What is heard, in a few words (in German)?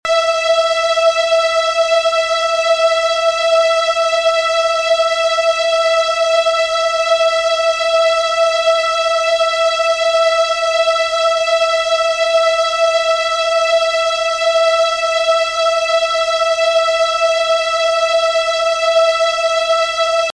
violine e